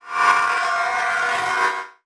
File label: Title Transition Feedback; Production Element